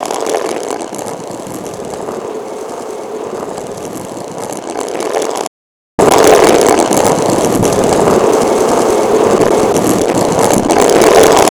Stone Roll; Resynth

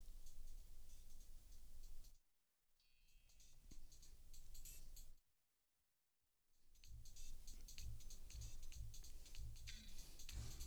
sing

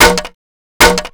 Resynth Wood Hit

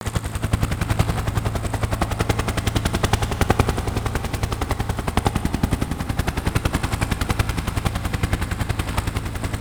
rotor

